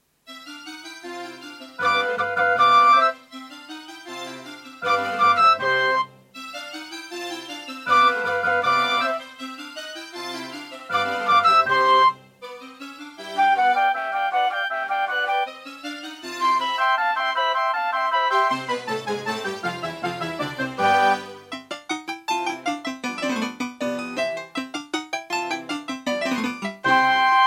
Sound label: Mozart - Concerto K467 partial, Pine PT-2030 Vivaldi Xtra Wavetable DB